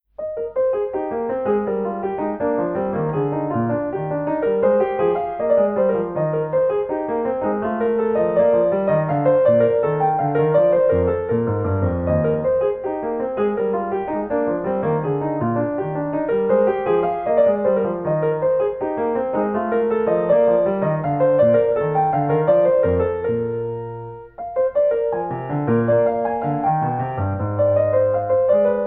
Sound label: French Suite E-Flat Major Gavotte; J.S.Bach; BWV 815